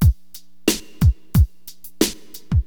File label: Drums loop, HipHop; LiveAct Pool 1